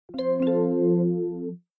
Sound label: First Time Use Earcon; Business skieme Beta 1